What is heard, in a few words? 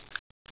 stapler; Image Bonanza; Expert Software; ondajob